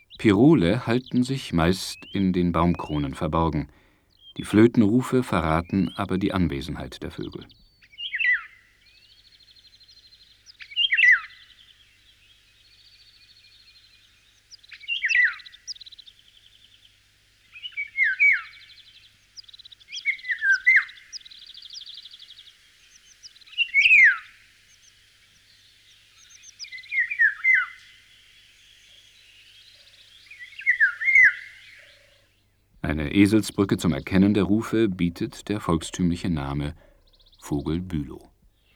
pirol, singvoegel, gesang; kosmos 93

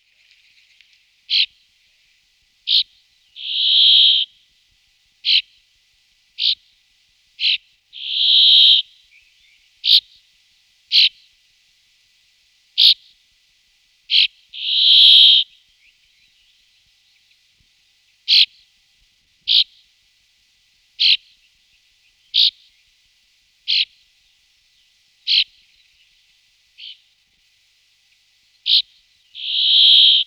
bergfink, gesang; singvoegel; roche 2004

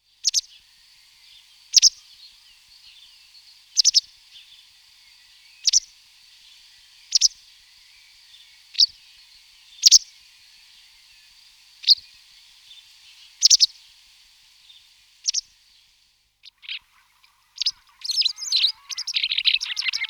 bachstelze, gesang; singvoegel; roche 2004

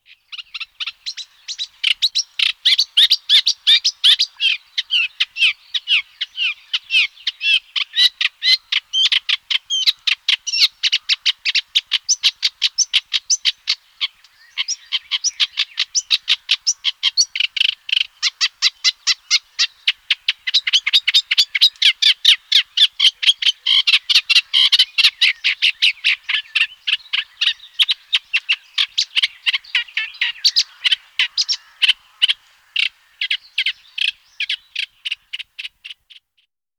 teichrohrsaenger, gesang; singvoegel; roche 2004

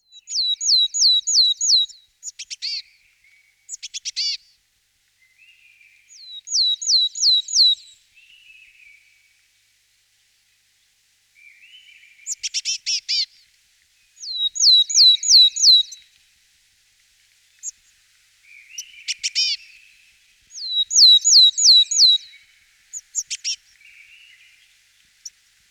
weidenmeise, gesang; singvoegel; roche 2004

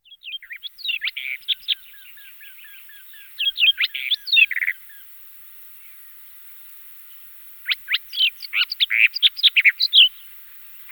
sperbergrasmuecke; singvoegel; roche 2004

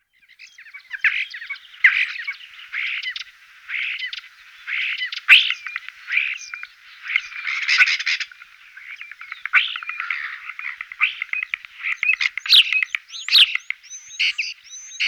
star, singvoegel gesang; roche 2004

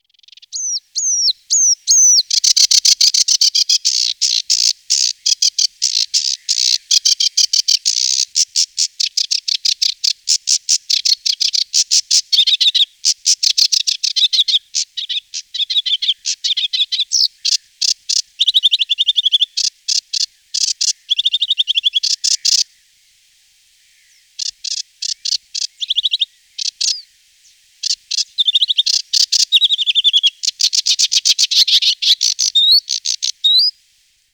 schilfrohrsaenger, gesang; singvoegel; roche 2004

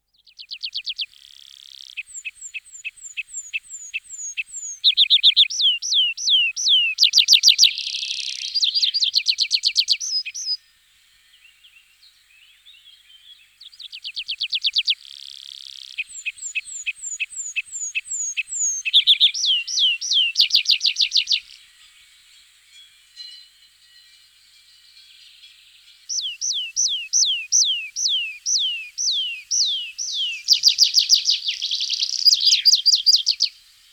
baumpieper, gesang; singvoegel; roche 2004

